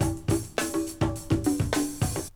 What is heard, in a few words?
D3 BREAK